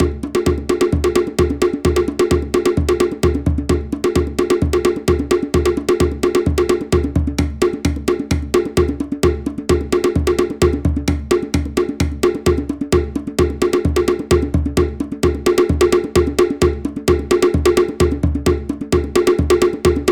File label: OTEA TITIRAINA MUA FAST, toere